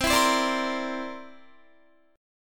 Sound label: C 9th, Guitar; strummed; 20,19,22,19,22,19; 4E,4A,5D,5G,5C,6F